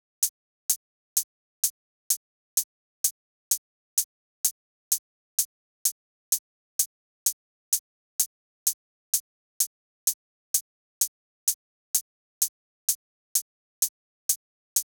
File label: high hat, Key C# Bpm 128; Big Room House; Loop Nerds; Big Room House Allstarz